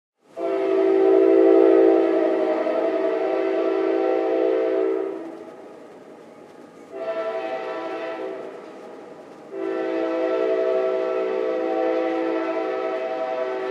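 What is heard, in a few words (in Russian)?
гудка поезда в Нешвилле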